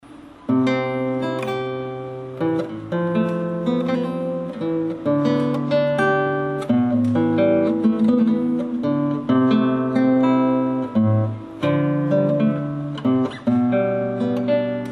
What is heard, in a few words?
Minuet, Guitar; Domenico Scarlatti